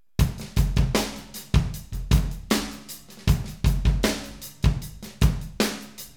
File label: Loop